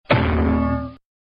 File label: cartoon bonk; made with Voicemod